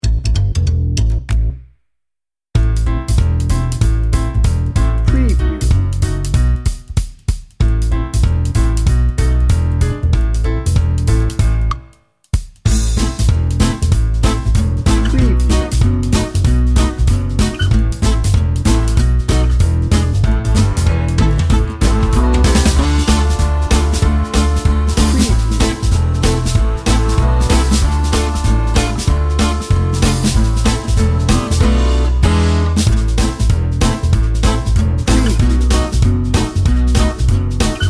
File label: gypsy fiddle jazz bed